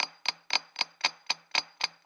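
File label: Timekeeper Stone Chipping 116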